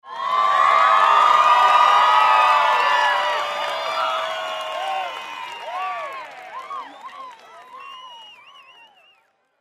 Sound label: Human Crowd Studio; Crowd Reactions Big Cheer; Sound Effects:Voice